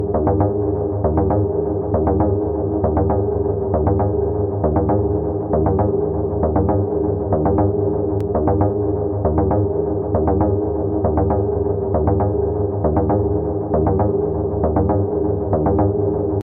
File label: techno pop background and airy chords; synth loops